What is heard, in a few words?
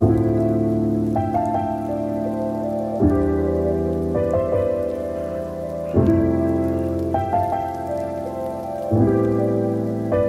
Rain on a train, lofi piano